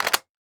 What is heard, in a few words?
Weapon Gun Machine Gun Thompson Sub Clip Insertion; AdobeCC